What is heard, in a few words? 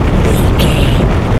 sci-fi vehicle spaceship jet engine loop